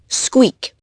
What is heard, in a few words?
squeak